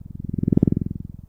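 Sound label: Cupped Pipe Hit Reverb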